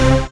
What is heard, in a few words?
Dance Mega Synth; Best Service; 90 s Sample CDs